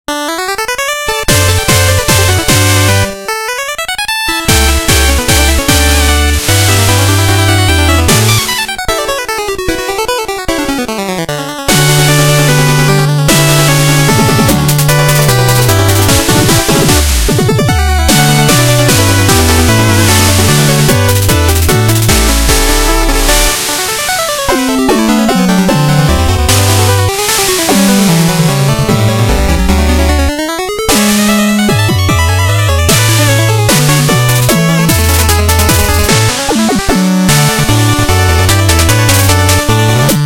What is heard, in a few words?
Music Card Boss; Shovel Knight King of Cards